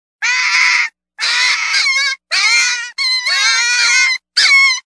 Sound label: Grey and Red Fox, predator calls